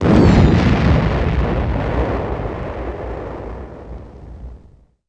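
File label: combat explode a wing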